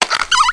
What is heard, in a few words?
Splat Squeak